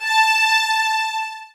SOFT VIOLINS

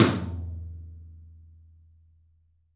13 TOM, 3D Drums; 3D Sound

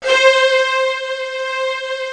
C5, SYNTHS; House Side Of 2 Step; USB Soundscan vol.51; AKAI

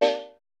perc, Roland MC-909

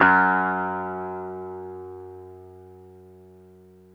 F#2 BASS; Zero-G - Chemical Beats

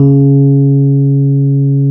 E PNO C#2; Synclavier Sampler Library

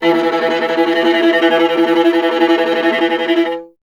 viola E; Miroslav Vitous; Solo Instruments VOL-1

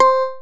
bass, synths

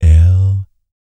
Vocal Planet R&B Dance BASS CATCH

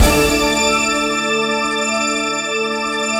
BIG CHORD

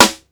TR1 snare; Perc & Kits; PlatinumPhattCD1; E-MU Producer Series Vol. 8 – Platinum Phatt; Mo Phatt

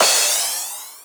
CRASH, 160 BPM; Ueberschall; Drum & Bass Resonance

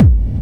hardbassdrumz, breakcore; Bazzdrumz

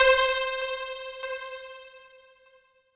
C4, SynthLine; NiceBeats

